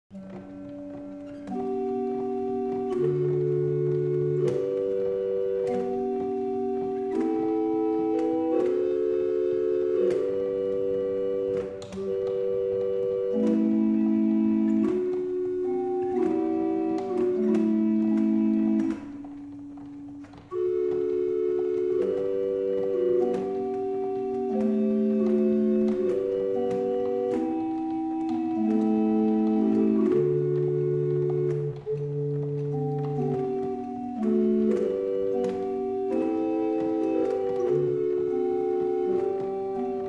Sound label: barrel organ; Liddington